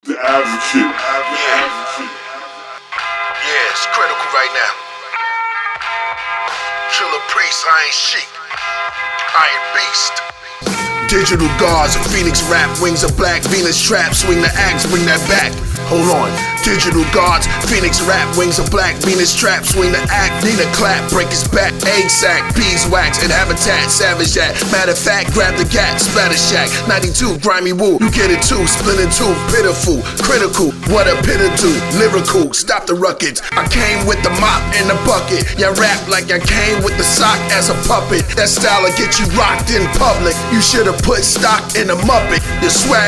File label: Rap